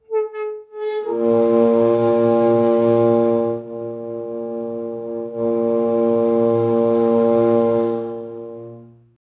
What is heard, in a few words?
a rusty off key tuba honk; brass